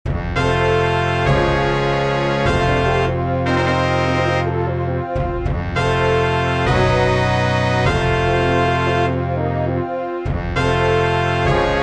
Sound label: fanfare